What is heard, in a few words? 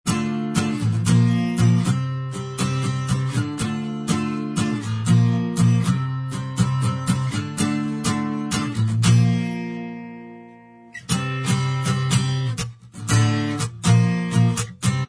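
acoustic, instrumental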